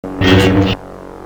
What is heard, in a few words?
sword mid slash; weapons